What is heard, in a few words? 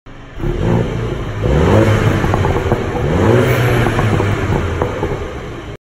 Mini John Cooper; مینی جان کوپر